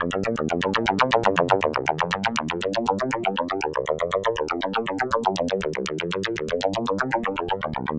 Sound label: Synth